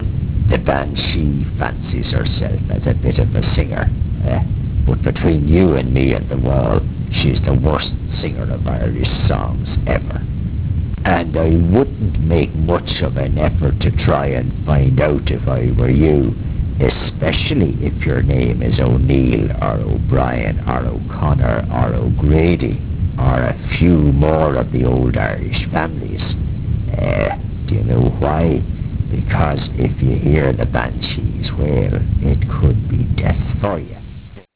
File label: banshee